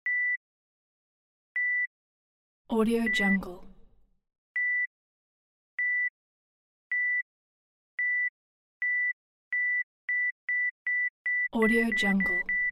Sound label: hospital heart monitor pack